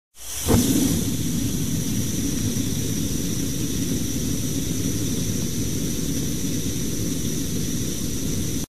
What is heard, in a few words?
Bunsen; Zomboid; Biochemistry